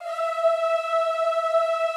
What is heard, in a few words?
E4 R, Classical Choir; Best Service; Extended Classical Choir